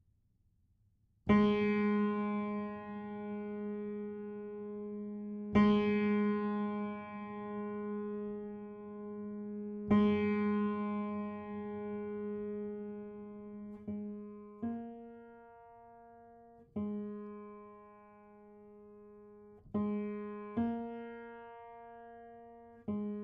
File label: christchurch bells